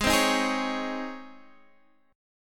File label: C sharp Major 7th Suspended 2nd G sharp